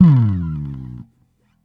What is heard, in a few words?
Bass Dive FX Medium, Electric Bass One Shots; Slate Digital BASSHOLE Sample Pack